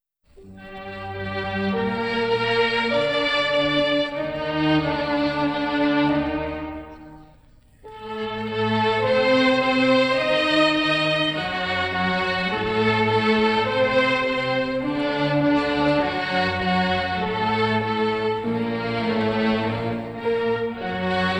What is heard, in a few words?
Harpsichord Concerto In D Minor Adagio Piano Version; J S Bach